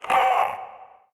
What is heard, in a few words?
Possessed Red Veil Vocal Pain